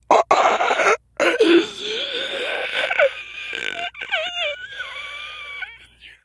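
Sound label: ambient zombie; cstrike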